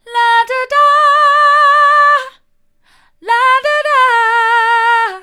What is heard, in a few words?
la do da mid, vocal